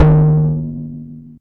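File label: TOM loud; Zero-G; Chemical Beats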